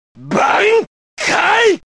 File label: Bankai Shout